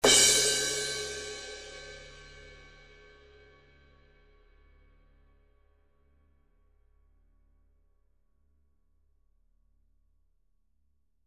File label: Custom Session Crash, cymbal